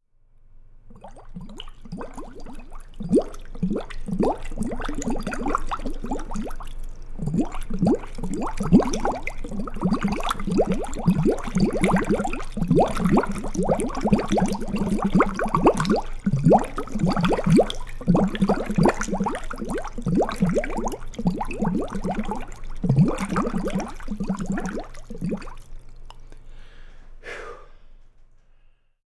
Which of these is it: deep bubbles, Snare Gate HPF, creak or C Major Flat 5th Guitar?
deep bubbles